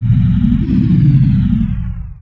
colony ship run; Sid Meier's Civilization 4 Complete; Beyond the Sword; Final Frontier; Units

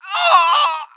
burn dying; Emperor - Battle for Dune

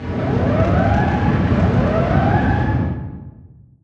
distance heavy cruiser damaged defend loop; Hearts of Iron IV Death or Dishonor

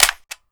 sniper military clip locked

